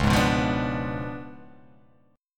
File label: C sharp 7th Flat 9th, chord